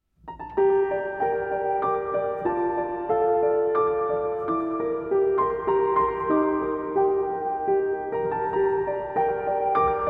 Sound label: Rain Piano and Violin